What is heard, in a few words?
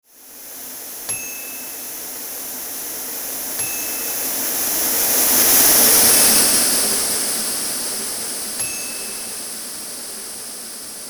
Bee Swarm flies close And Then Flies Off